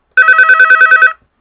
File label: Ring; Doro; PhoneEasy-337ip